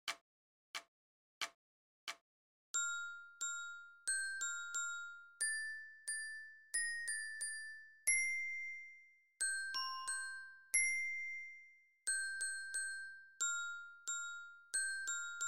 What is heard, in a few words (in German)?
Lyra; Yorkscher Marsch